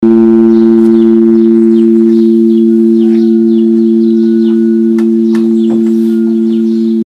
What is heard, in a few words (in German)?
Original Grosse Glocke Ausschwingverhalten